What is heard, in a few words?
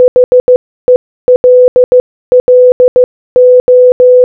HELLO Morse Code